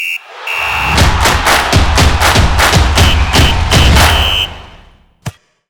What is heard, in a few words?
Stadium Football Stomp